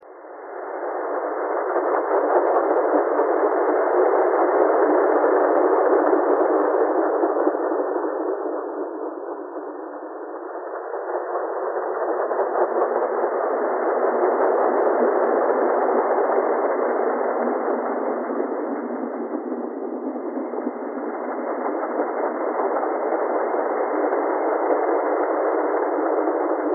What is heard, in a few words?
thread buzz